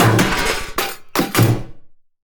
Race Music Ending; K Drive Races; Fortuna

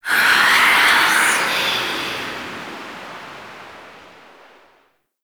Tailor Shop Door Whispers; Warframe; Ambience; Nineteen Ninety Nine

